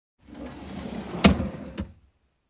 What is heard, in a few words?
Closing Big rolling door